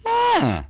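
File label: male happy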